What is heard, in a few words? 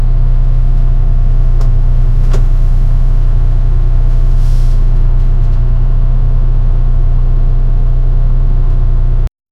bus luxury MCI exterior